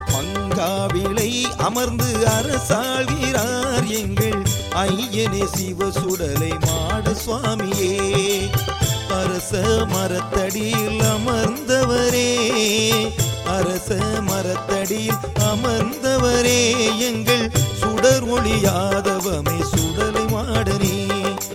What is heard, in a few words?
Ringtone